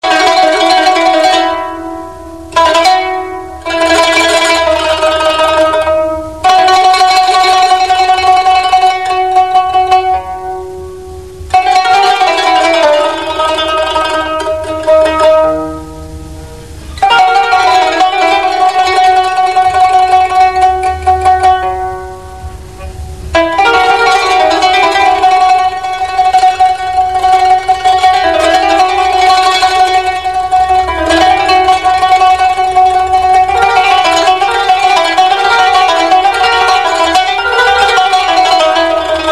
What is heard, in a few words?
ganun, instrum